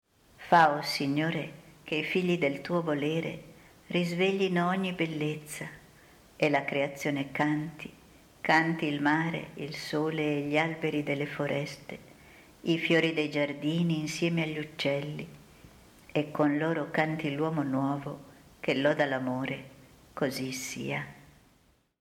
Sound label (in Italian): canto della foresta